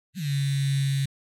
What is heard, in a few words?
Phone vibration